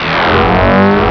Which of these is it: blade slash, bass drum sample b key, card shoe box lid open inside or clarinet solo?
blade slash